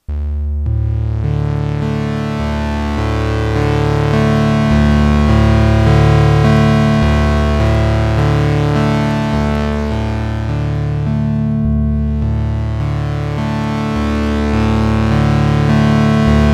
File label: wave mod; deMOON